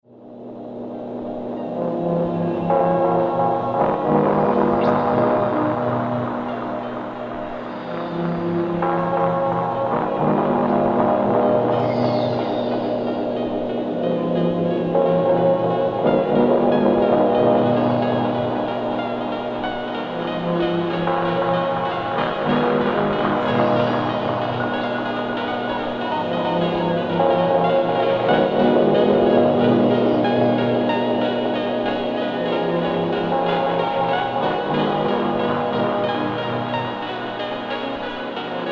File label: Wurlitzer Tapeloop excerpt